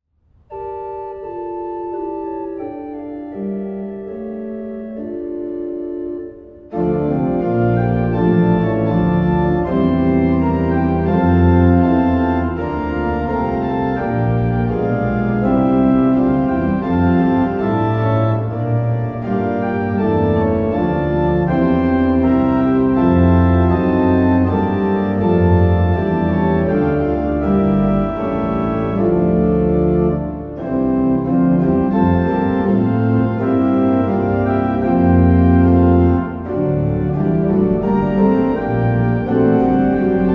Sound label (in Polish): hymn akompaniament